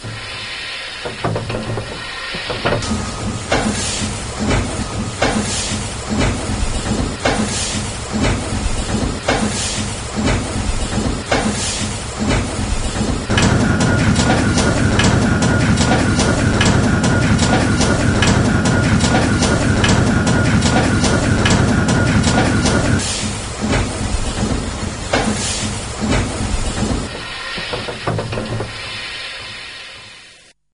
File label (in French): Vapeur